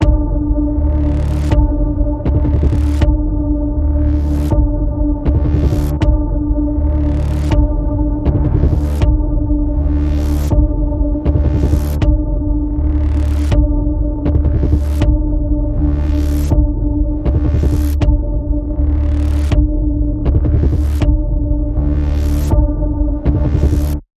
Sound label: Ominous Chase Panning Pulse D, Key of D